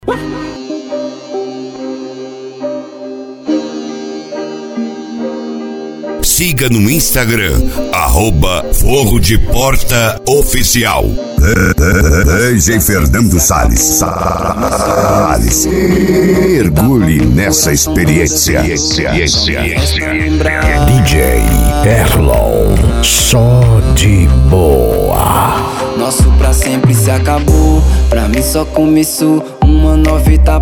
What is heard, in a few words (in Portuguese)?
rap